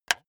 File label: wood board hit hard; objekte; holz